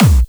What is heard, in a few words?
Clubby Kicks, Bassdrums; Vengeance - Essential Clubsounds Vol.5